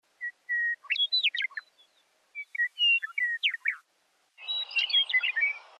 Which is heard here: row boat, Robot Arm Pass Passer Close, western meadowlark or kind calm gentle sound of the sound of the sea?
western meadowlark